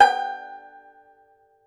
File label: Tenor Banjo, Plucked Strings